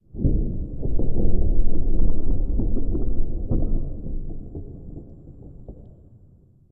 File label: Glacial Movement